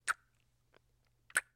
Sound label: Heavy Kissing, Heavy Kissing With Wet Lip Smacks. - Wet Kisses